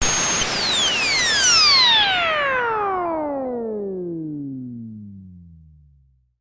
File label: engine rev down, engines